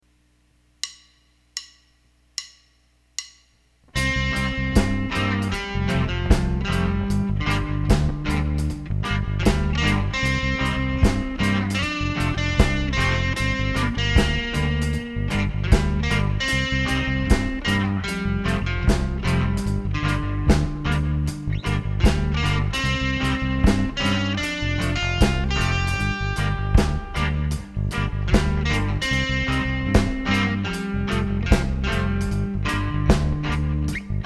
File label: Reggae Idea